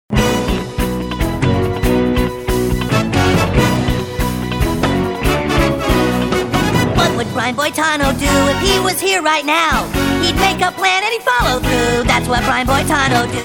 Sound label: music stereo; 128kbps stereo; MPEG layer3; Zvuk je jednake kvalitete orginalu (ne čuje se razlika); PCM 44KHz, 16bit, stereo